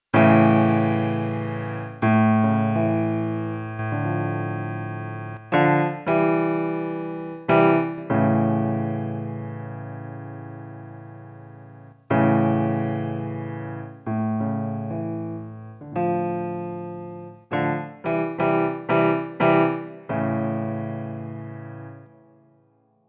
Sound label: PIANO